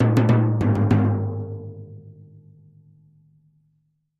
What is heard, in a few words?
Drums Toms B Accent